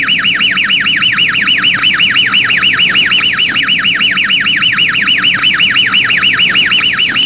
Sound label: car alarm